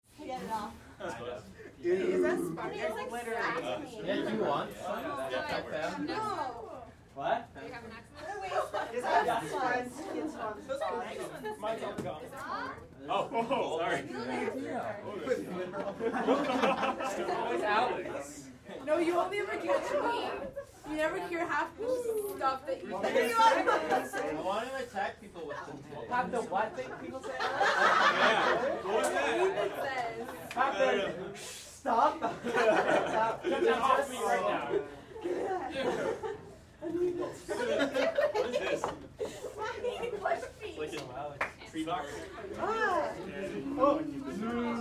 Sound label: Crowd Teens Party Chatter Reactions; Crowds